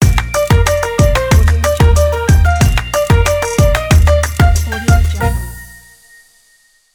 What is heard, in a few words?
fun logo